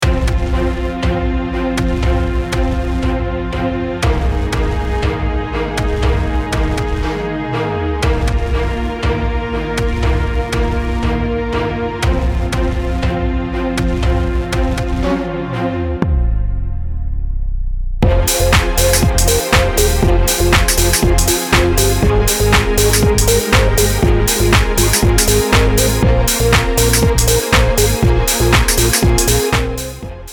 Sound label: epic dramatic score